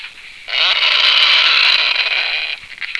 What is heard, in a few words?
growl; Powdermill